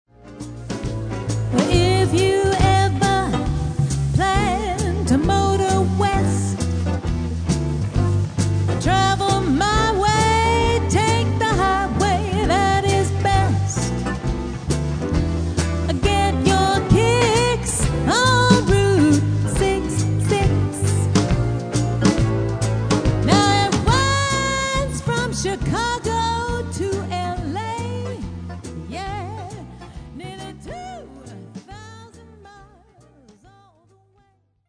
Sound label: Bass and Snare